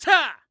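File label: Mon Man ATTACK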